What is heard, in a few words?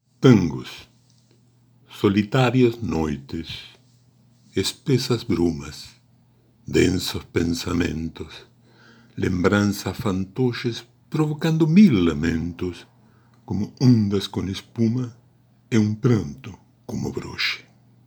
Tangos